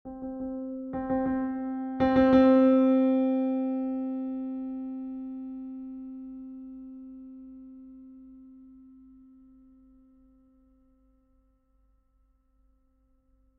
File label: 9 notes, piano numérique; Je joue 3 notes "piano" (31,32,33 sur une échelle de 1 à 127) dont la nuance est séparée d'une unité, puis 3 autres mp/mf (63,64,65); Je joue 3 notes "piano" (31,32,33 sur une échelle de 1 à 127) dont la nuance est séparée d'une unité, puis 3 autres mp/mf (63,64,65), et 3 a